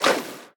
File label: swim; smite; poseidon